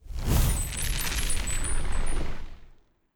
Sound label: Artic Spell Wind; Legend of Keepers (gamerip) (2019)